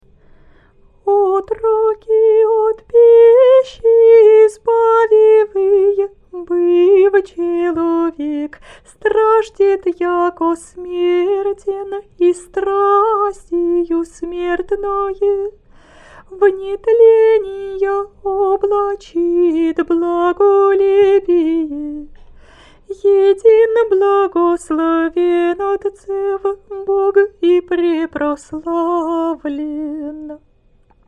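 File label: easter canon byzantine hirmos slower, singing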